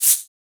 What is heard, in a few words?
shaker d sharp; tuned percussion